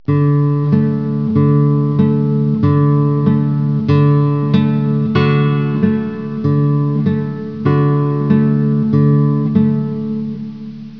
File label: Travis Bass